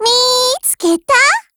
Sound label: combo break